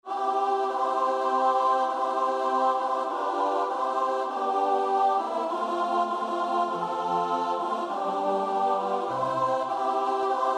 choir

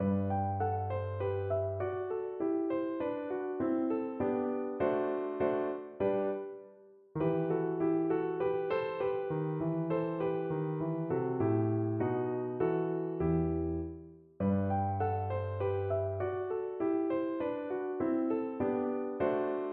piano

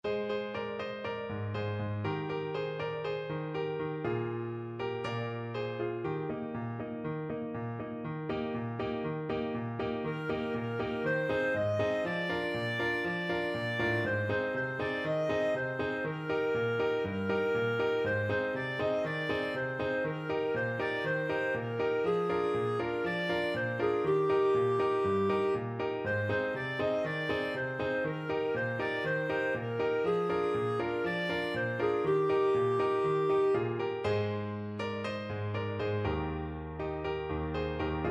clarinet; falile se kastelanke

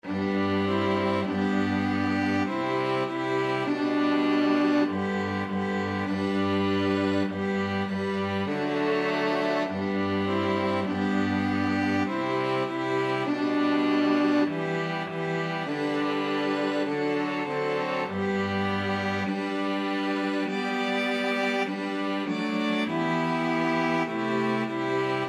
STRQ, string quartet; firm foundation

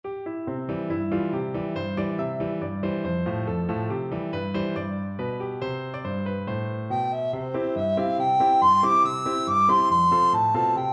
the irish rover recorder

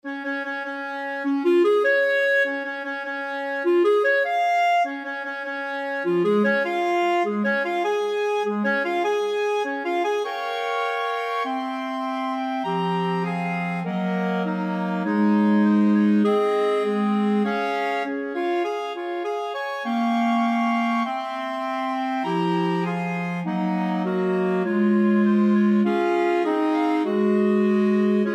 5 clarinet, clarinet choir; mendelssohn

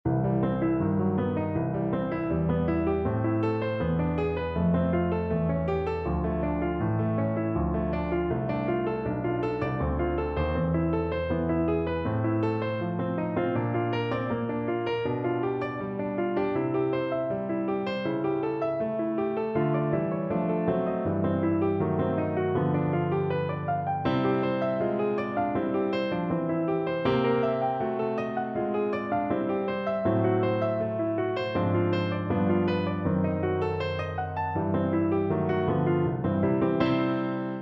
afterglow, piano

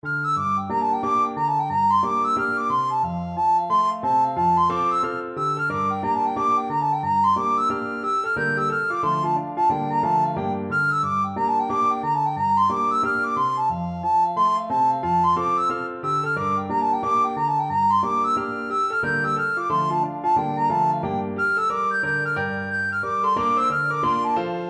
swallowtail, recorder

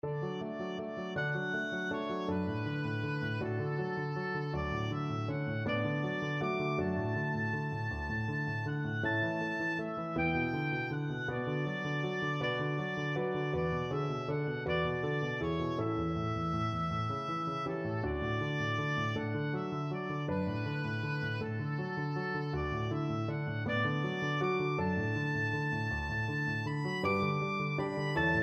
puccini beloved father, oboe

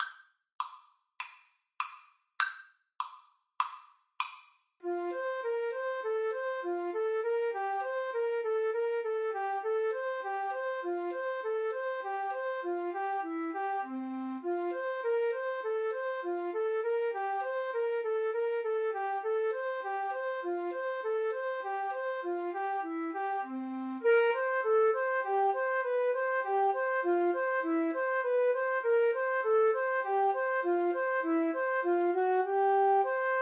schumann melody, flute duet

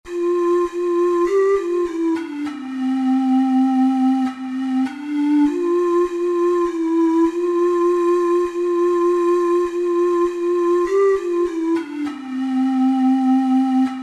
ding dong, ocarina four hole

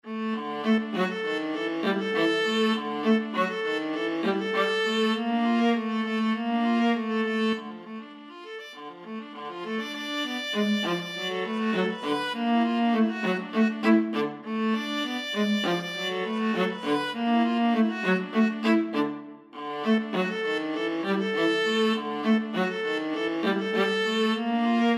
viola duet

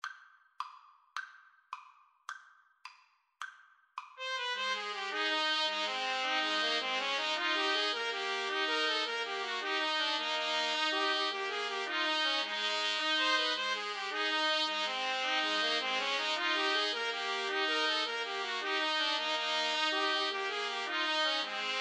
3TPT, Trumpet Trio

